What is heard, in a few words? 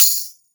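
Tambourine Stick; Roland SPD-S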